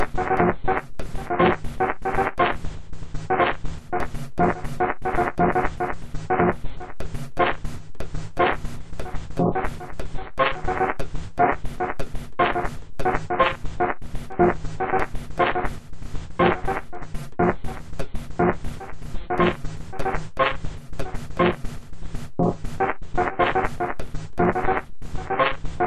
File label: shuffle